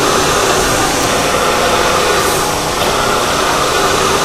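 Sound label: paint piston travel up loop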